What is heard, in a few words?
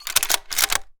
sniper reload; hygrounds